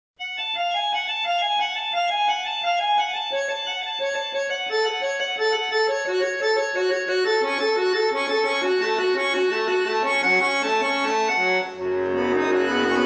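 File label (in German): Praeludium und Fuge fis-moll, Akkordeon; D.Buxtehude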